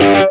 window maximized; jam; stereo

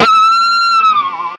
link pressed; jam; stereo

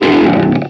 window close; jam; stereo

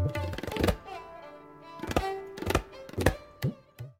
dialog warning, sitar; stereo

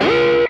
system ready; jam; stereo

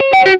dialog warning; jam; stereo

